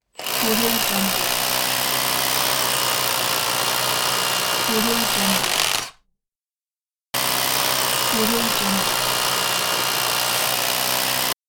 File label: drill perforator